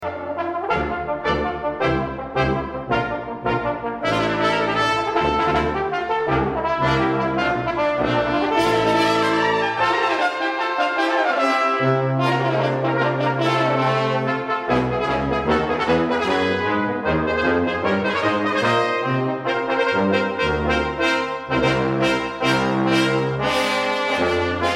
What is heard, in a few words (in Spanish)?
Son de Mexico; Suite Americana V